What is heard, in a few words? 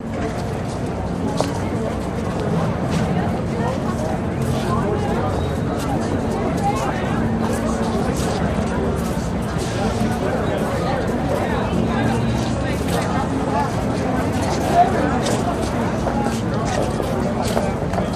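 Crowd Waiting Ambience, murmurs various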